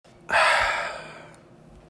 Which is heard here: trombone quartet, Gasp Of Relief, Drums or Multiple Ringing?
Gasp Of Relief